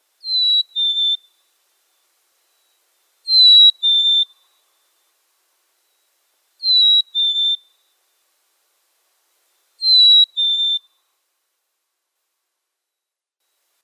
suara kicauan burung black capped chikadee

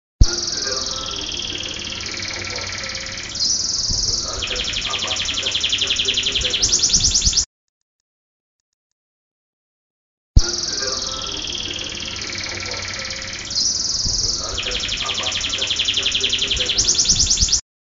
audio kenari

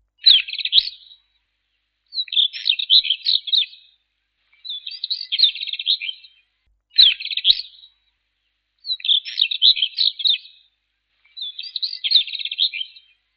suara decu batu

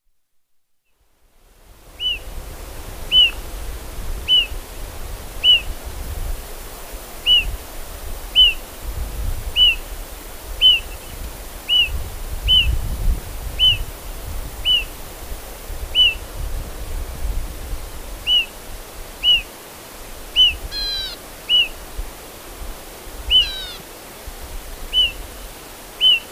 decu call

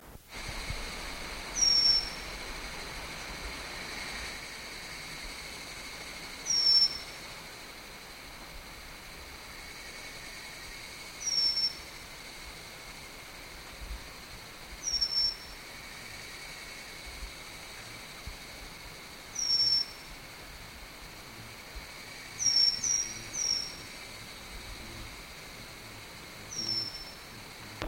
ciung suara panggilan

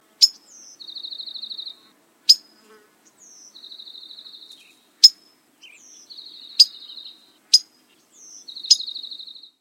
suara panggilan kardinal biru

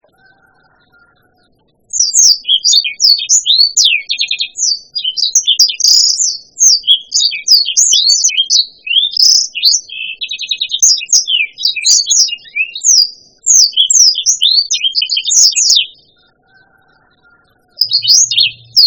suara kicauan burung blacksang

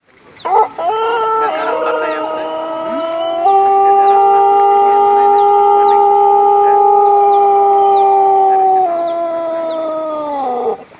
ayam pelung

